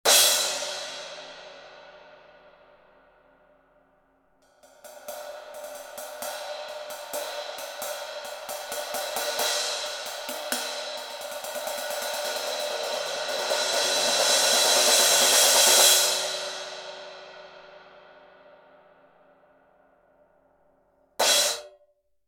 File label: araya crash 17, turkish